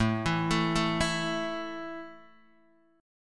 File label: A 5th A down Guitar Open D